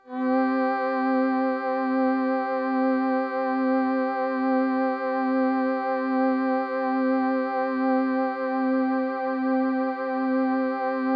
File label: C4, Synth Line; 90 s Sample CDs; NiceBeats; SynthLine VOL-32